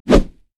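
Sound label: armadillo basic attack; rammus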